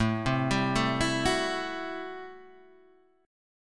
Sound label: A Minor 13th, Guitar, Standard; Am13 arpeggiated; JGuitar